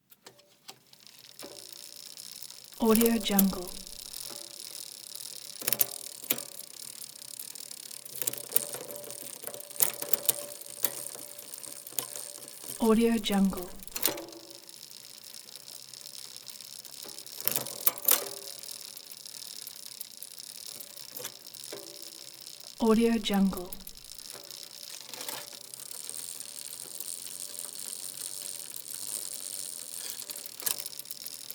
bicycle pedal change gears and freewheel ratchet

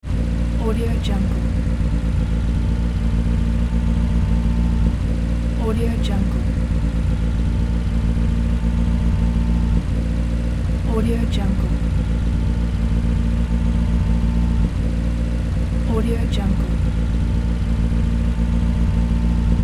racing car engine

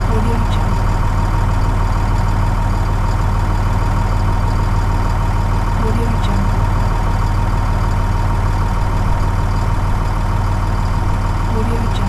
bus engine

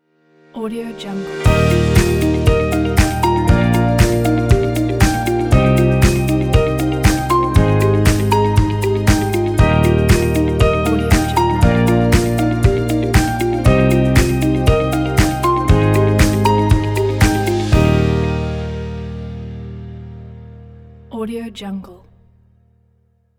inspiring corporate logo; dailywaves

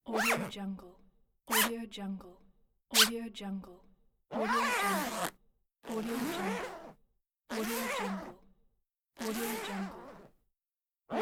zip; blackdragonfx

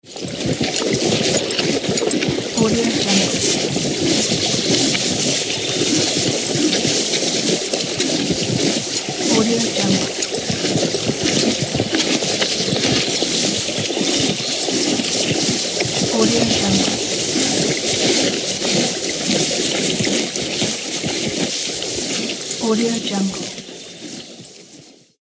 horse eating grass

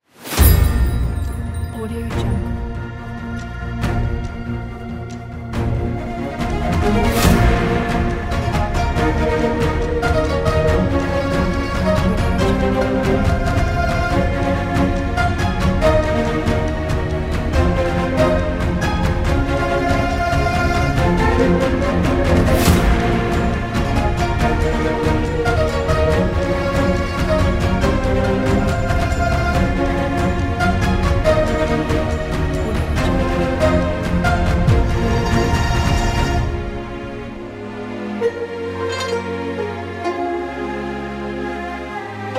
epic chinese kungfu action

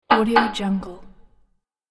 hammering metal

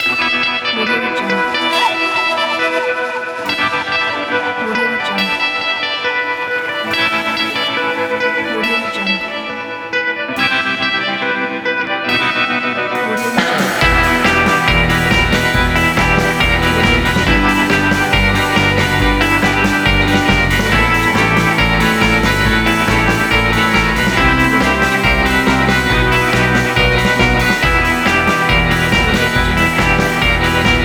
surf rock; audiodarts

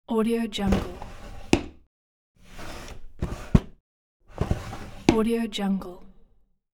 cabinet door slide open close; hollywoodedge

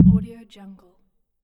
activity fx

